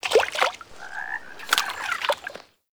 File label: wetland forest day frog; Ambience